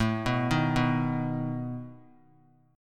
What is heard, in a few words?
A Suspended 2nd Flat 5th A down; Asus2b5 arpeggiated